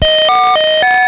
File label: pacman atari start